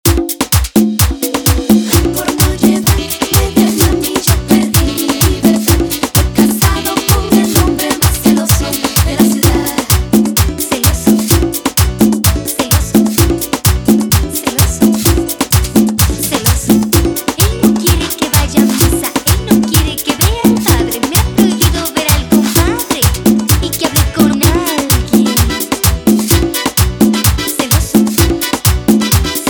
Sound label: 128bpm